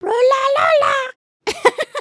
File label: train sit, emotes